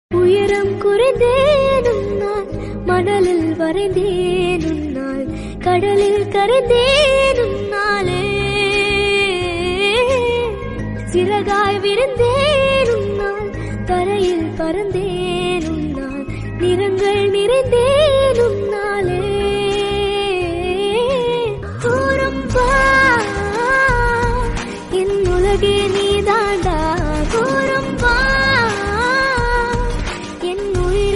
Female Version Ringtone

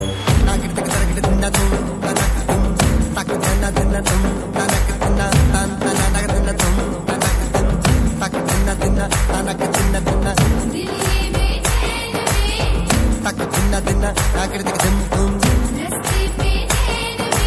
western Ringtone, Remix Ringtone

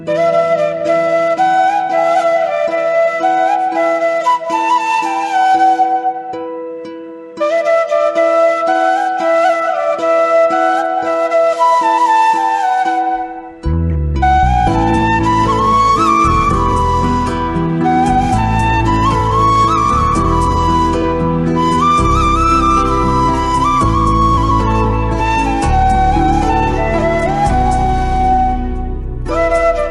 flute

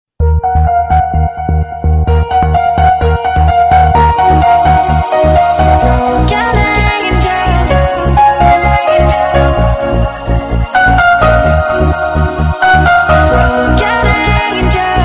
Ringtone Remix

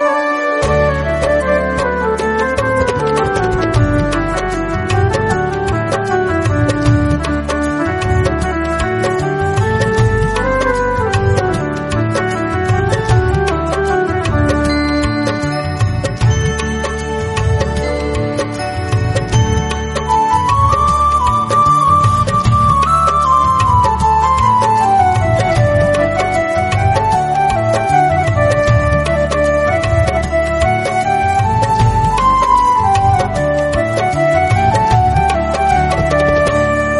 Instrumental

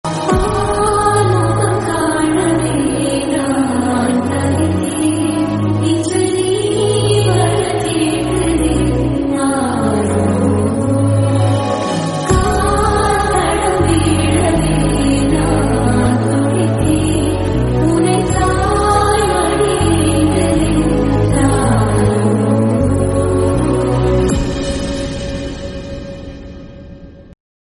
Song Ringtone

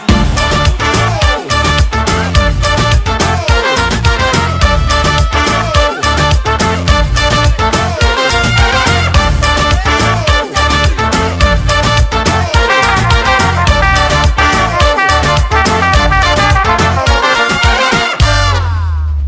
Instrumental Ringtone

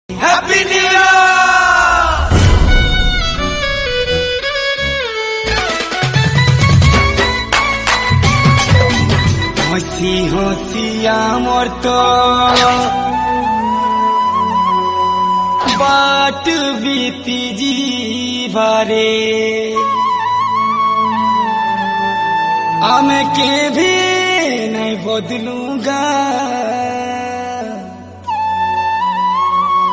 Sambalpuri Happy New Year Ringtone

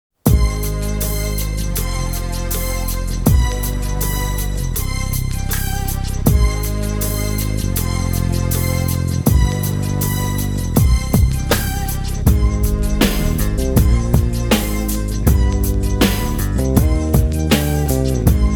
Instrumental Ringtone

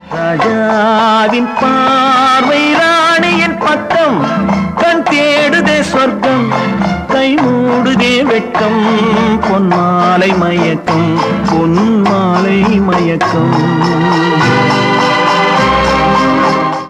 Male Ringtone

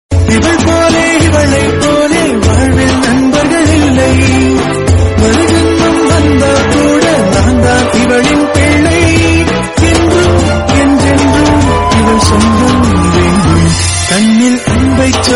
Sister Birthday Bgm Ringtone, Tamil

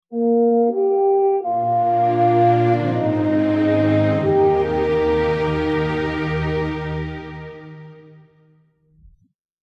Instrumental Engagement Ringtone

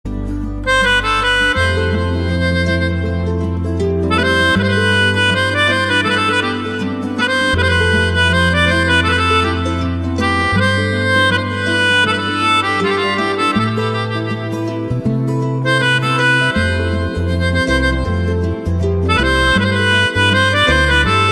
Instrumental Ringtone

